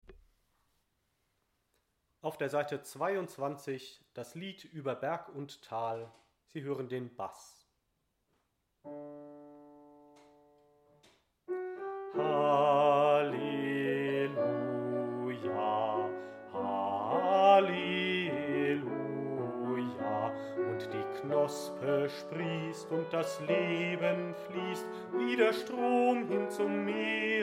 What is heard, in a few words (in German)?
Bass; Chorfest der EKM auf der BUGA